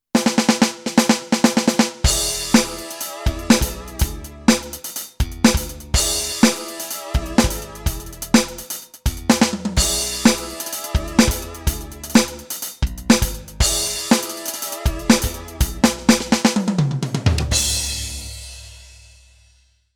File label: Thomann MPS 850 Kit Funk Band